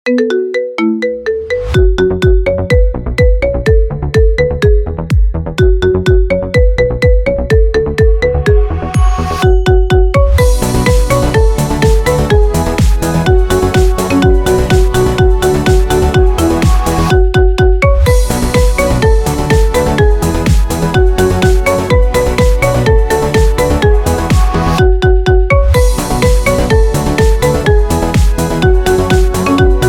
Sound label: marimba ringtone